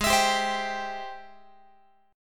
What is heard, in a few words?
Ab Augmented Major 7th, Guitar; Ab+M7 strummed; 4A,4E,5A,5D,5G#,6C#